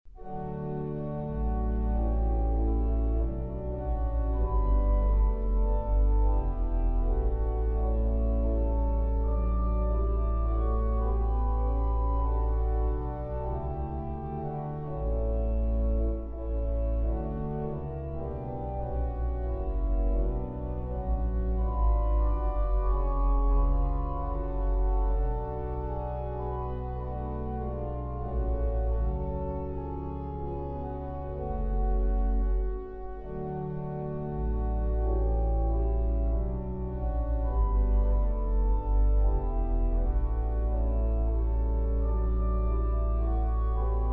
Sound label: Mendelssohn organ sonata no 6 in d minor Finale Andante